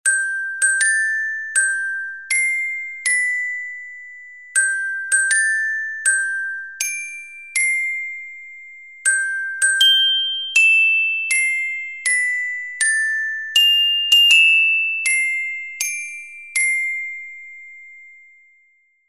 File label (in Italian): percussioni tanti auguri Glockenspiel; Spartito per percussioni; Le note di Tanti Auguri a Te, in una versione facile per glockenspiel